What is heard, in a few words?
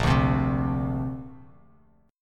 A sharp Major Add 9th, Bass Standard; strummed